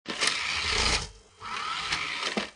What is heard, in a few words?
cdrom drive